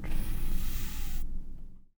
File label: puff; taunt; Garry's Mod; tf2